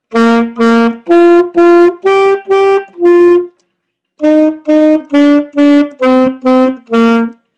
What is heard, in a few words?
Sax Song Twinkle